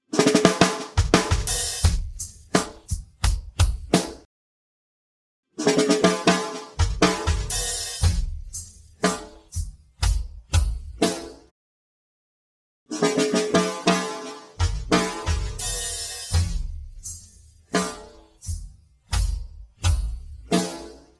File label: drum intro